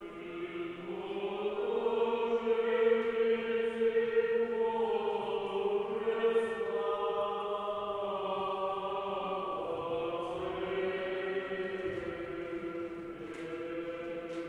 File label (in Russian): Хор Рогожской общины, 2 глас; Жизнодавче Христе Боже; на Бог Господь